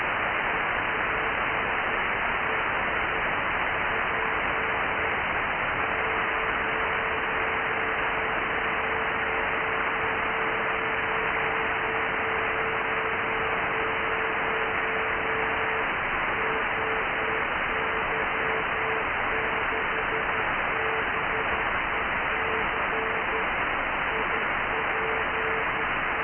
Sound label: Beacon; contest portable